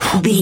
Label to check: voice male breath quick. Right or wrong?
Right